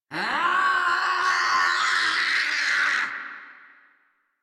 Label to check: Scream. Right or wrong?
Right